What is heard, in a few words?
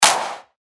Clap; Ingenious; revealed claps snares vol 2